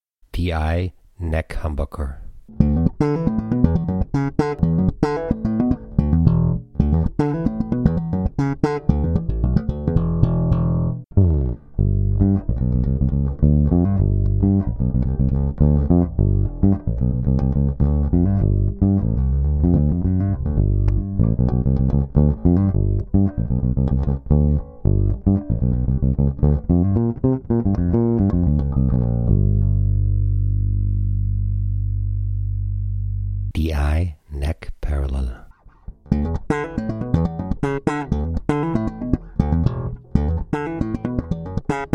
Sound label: Neck Pickup